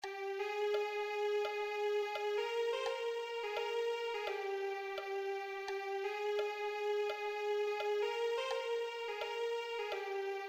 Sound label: viola